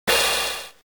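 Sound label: Drum Cymbal Crash; WarioWare Inc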